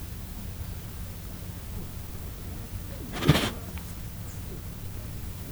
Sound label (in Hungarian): kerítésnél, bengáli tigris; miskolci zoo 2009